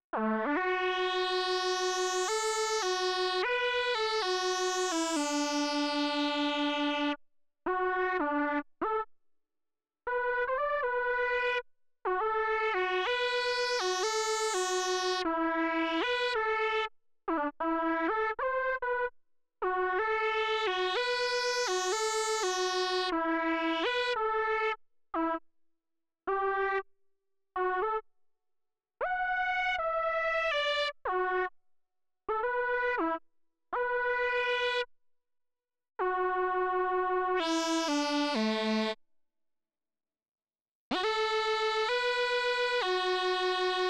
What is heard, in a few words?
MOOG